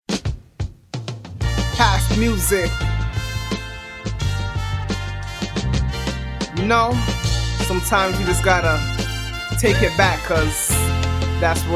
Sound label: Past Music